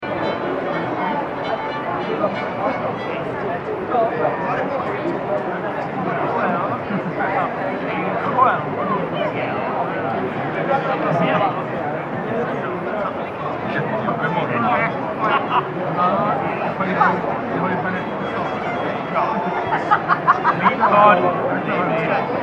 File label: AMBIENCES Medieval Village loop stereo